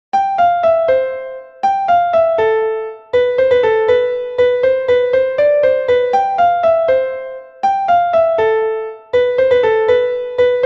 mazurka; ma mignonne

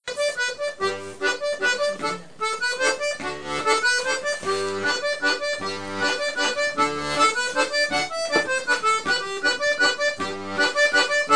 mazurka

